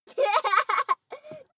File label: child laugh